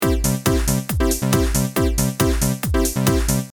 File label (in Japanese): シンセバッキング